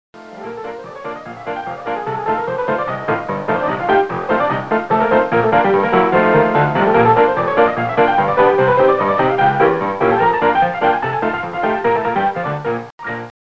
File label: RagTime; R. Crumb Screensaver & Companion